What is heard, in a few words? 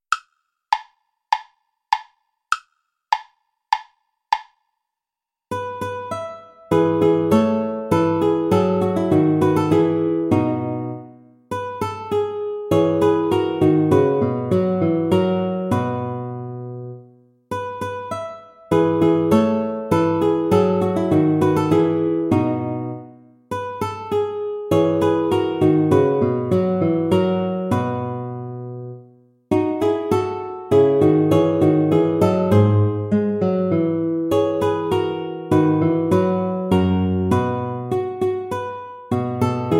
play along without guitar